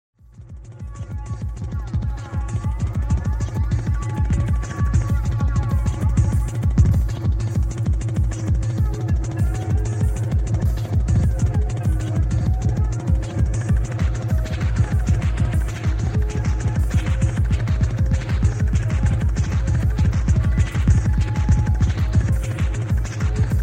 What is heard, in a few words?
noise